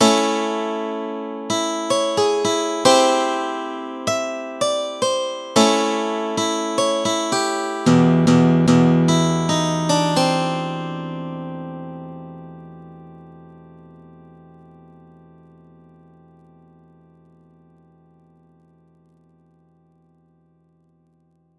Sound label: Intro Guitar; Better Call Saul